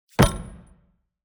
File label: morgenstern grab; player